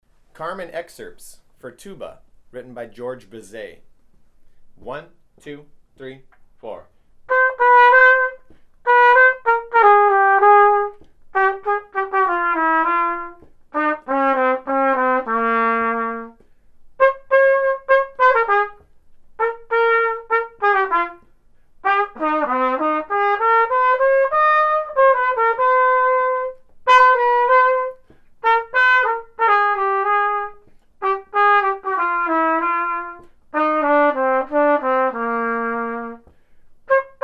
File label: carmen excerpts - tuba